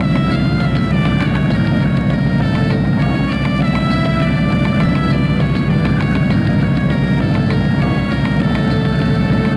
boat, Nile; Splashdown; XBOX; Soultree

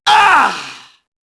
mobster get hit; enemy; Max Payne

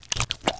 spray drip

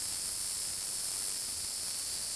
cicadas; Ambient; MX vs ATV Unleashed

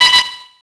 time out beep; Max Payne

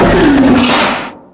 Crane stops; cold steel

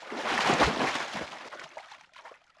slide, splash; Splashdown; XBOX

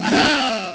skeleton attack; Pirates Of The Caribbean; DUEL

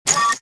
switch; Star Wars Jedi Knight II Jedi Outcast; XBOX; switches

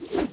gren whsh, weapons

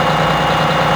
new bus loop; vehicles; Tony Hawk's Project 8; XBOX

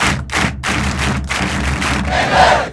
chant england, Crowd; David Beckham Soccer; XBOX

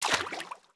FS Water Deep Wade, footsteps

